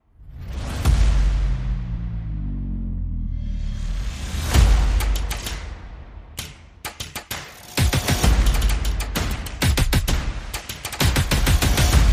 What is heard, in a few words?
Percussion and Effects